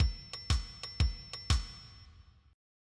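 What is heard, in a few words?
rock n roll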